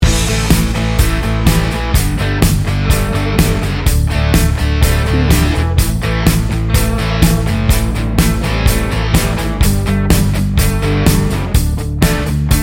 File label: texas rock loop